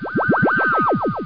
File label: SciFi